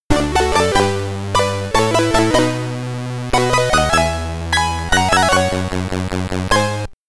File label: Game Over, SID Stereo, Commodore 64